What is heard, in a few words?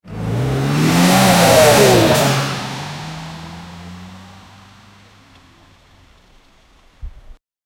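create car race tyre crash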